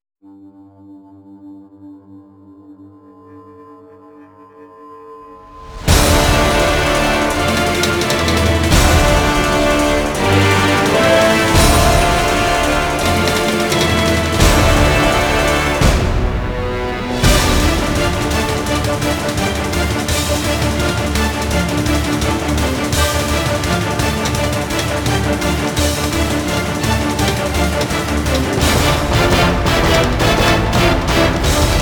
instrumental

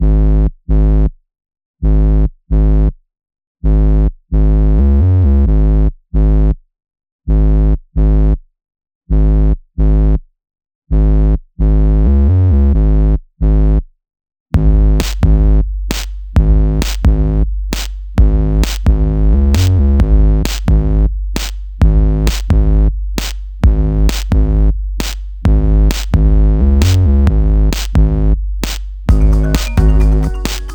tubular bells

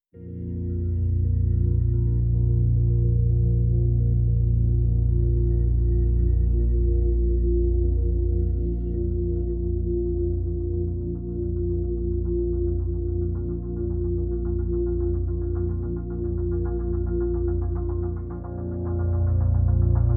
pads and pulses

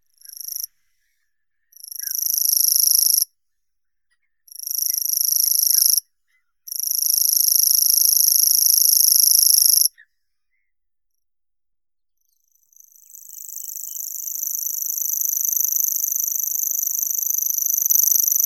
sverchok obiknovennii locustella naevia

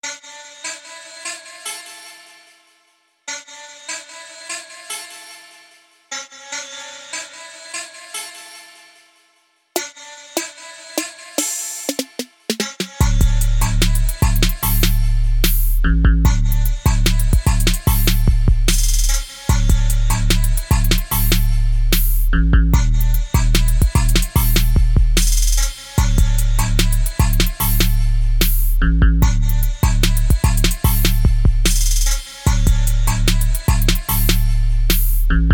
instrumental

